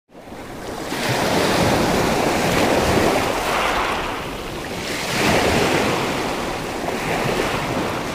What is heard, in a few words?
Sea; Relax video. Sea